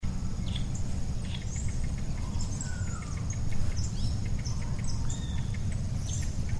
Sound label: black headed berryeater carpornis melanocephala threatened cotinga; last heard here a year ago